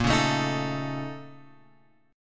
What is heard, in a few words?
A sharp Minor Major 11th, A sharp; {6 4 7 X 4 5} strummed